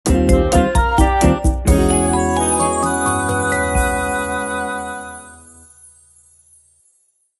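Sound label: Jingle Bossa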